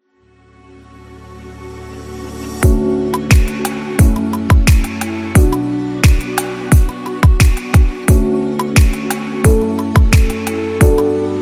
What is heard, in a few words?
Chill Fashion